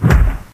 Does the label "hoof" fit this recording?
Yes